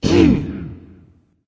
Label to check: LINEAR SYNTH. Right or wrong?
Wrong